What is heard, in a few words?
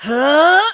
Throw, Barks; Kuva Lich; Persona B; Ability